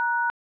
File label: dtmf pound